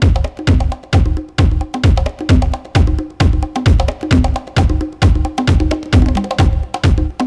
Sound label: Bangin Loop